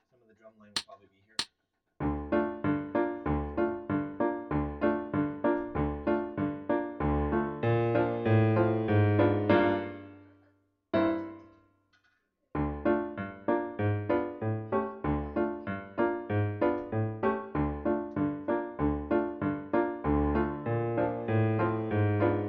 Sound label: Bergamask-Full Tempo Accomp only, Flute; Koepke